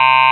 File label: android, Digital Synths; Ableton Foundation FreePacks